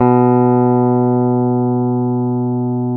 lap steel

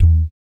BASS DUM; Spectrasonics; Vocal Planet; Jazz FX

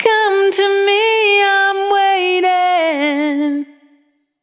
Single Voice Melodic Loops

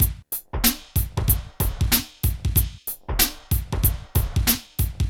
PHATT BEA

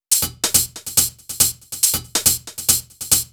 Hh Loop, Drum Loops 140bpm; Zero-G Trance Inducer

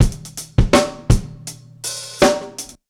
Hiphop 82bpm; Hiphop love; Hip-Hop Street Beats Construction Kit; Street Beats by Poogie Bell

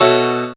b, Techno Piano Chords; Dance Synths

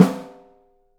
OHSTSN L, 3D Drums

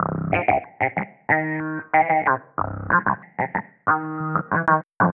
Slap Wah, Bass; Sonic Foundry (Sony Creative Software); Synchro Funk

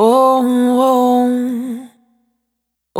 Urban, Single Voice Melodic Loops, Vocal Samples

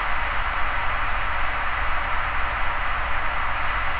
InfiniVerb Noise, sparse soundscape Loops

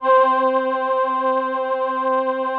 VP-330, String Machines

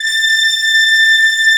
ORCH A4 FF, Orchestra; Sound On Sound; Peter Siedleczek's Orchestra

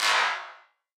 Wider Clap; stereo toolkit samples

